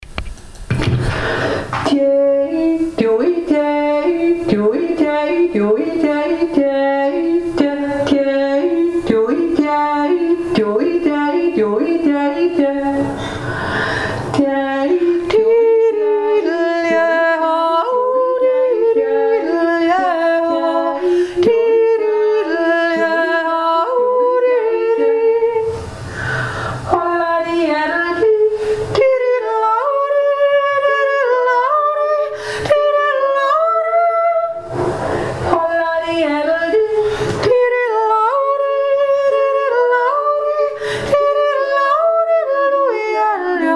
plisse jodler

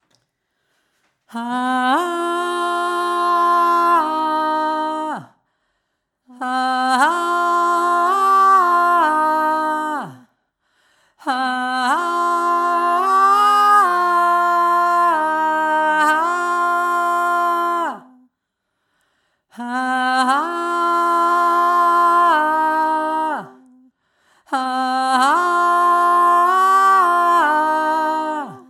roma klagelied hauptstimme

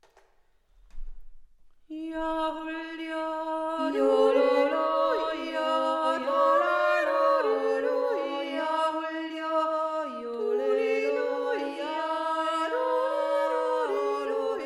Zwischenteil schweizer Jodler zweistimmig; ohne Bass

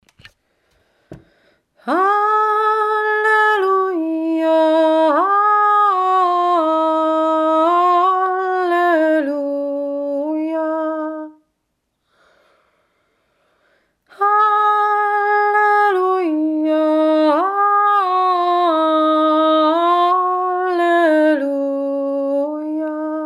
halleluja

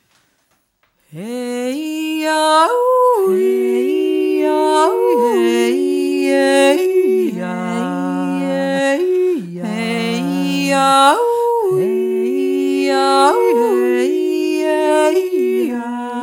Jodler Kanon 2 Stimmen

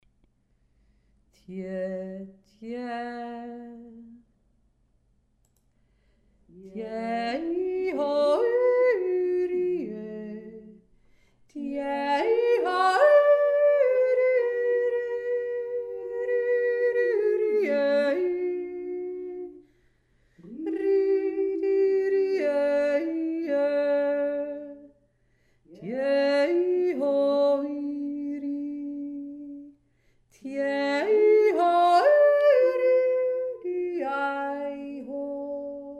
s dtiroler mitt ere stimme, jodler

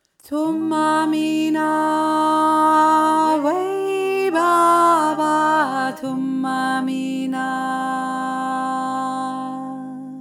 thuma mina hauptstimme

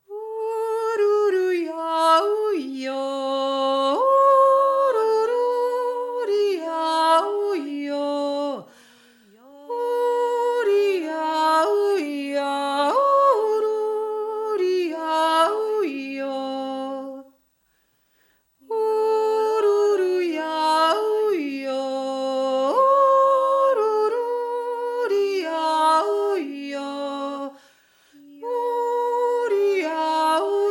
naturjuuz obere stimmme; obelwaldner

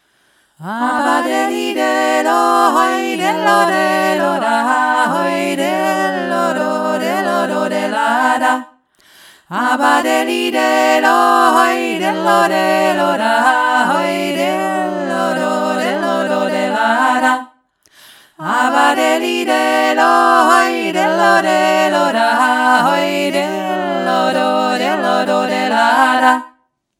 aba deli delo georgisches arbeitslied

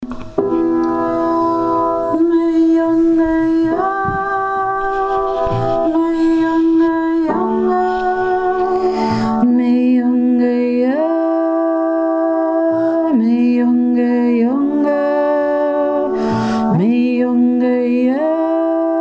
untere stimme; archaische gesaenge goldegg oktober 2023

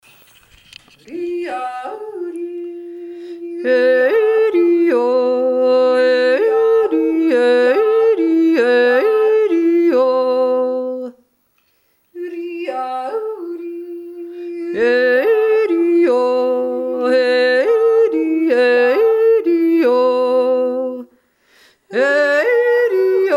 reiberer untere stimme

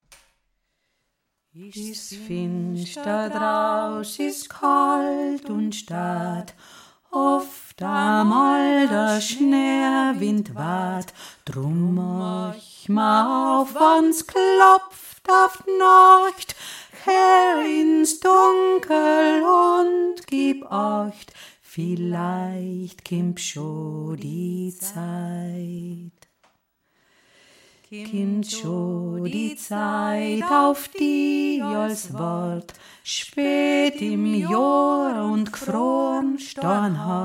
is finster drau alpenl ndisches weihnachtslied tiefere stimme

